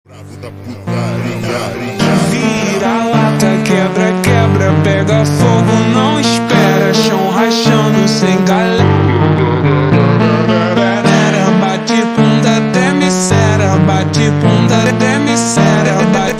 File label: montagem ultra slowed reverb